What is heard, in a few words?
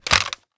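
inspect movement, weapons; cstrike; valorant; reaver vandal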